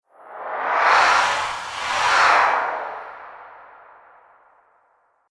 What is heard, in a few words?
Slow In And Out Zoom, whoosh; Whoosh Sound Effects